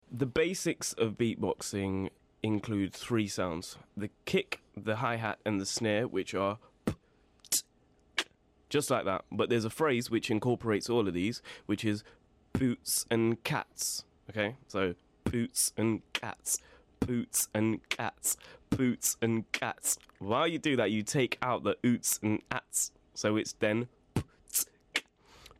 tries beatboxing

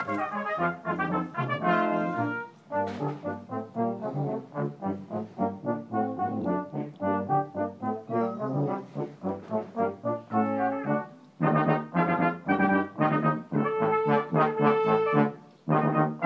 neighbours band jamming

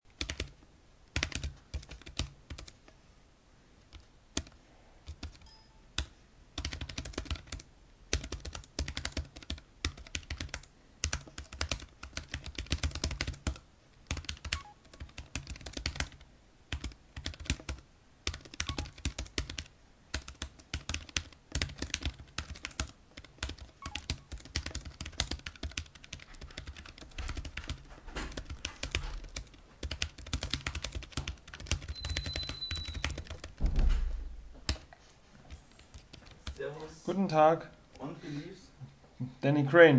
keyboard overkill; vgn-p11z

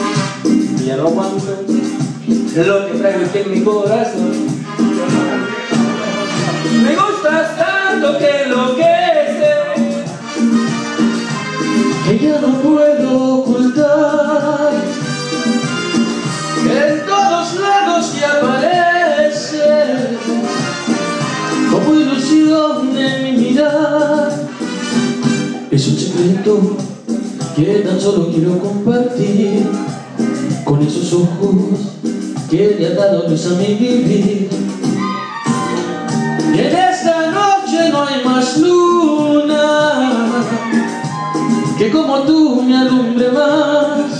karaoke at lounge; hombres

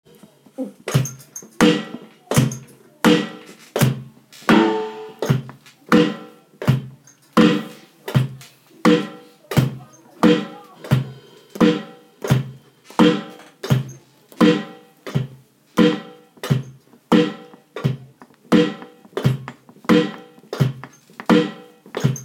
drums

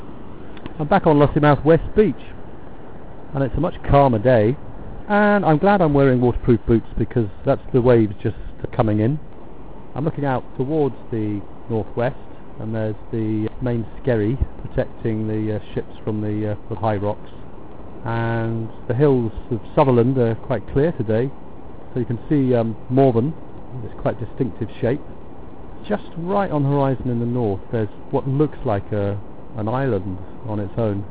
west beach

